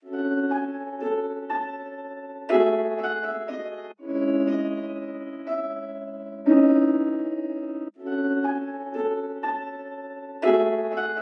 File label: 121 BPM C# Min Keys; Loop Stems; Cymatics; Ashtray